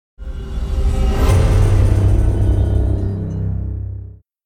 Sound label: Horror Logo